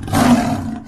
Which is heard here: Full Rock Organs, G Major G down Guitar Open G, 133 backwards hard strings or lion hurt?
lion hurt